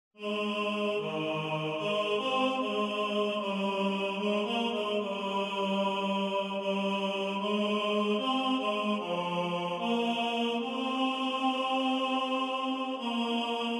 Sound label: TENOR; Bereden vag